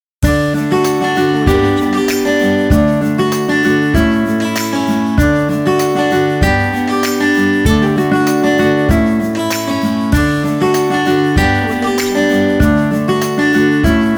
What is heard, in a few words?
acoustic fun guitars